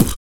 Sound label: VOX PRC, VOX KIT; ILIO; Vocal Planet VOL-3; Jazz & FX